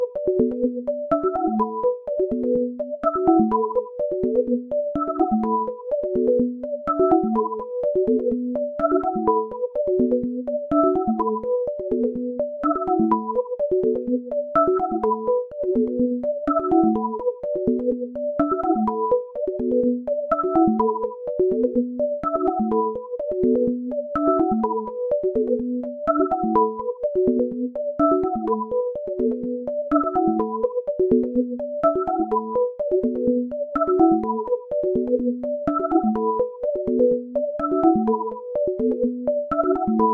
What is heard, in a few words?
techno; Loop Techno